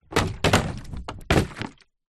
foley bottle water large fall roll down small stairs